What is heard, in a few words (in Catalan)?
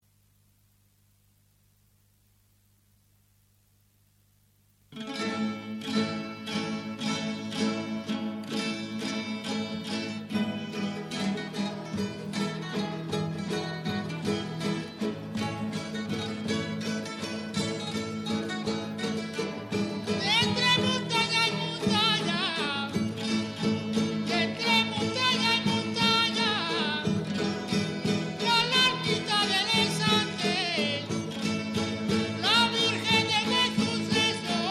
Jota i fandango; de Cabanes